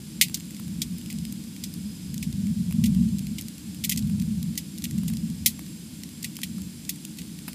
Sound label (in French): feu mix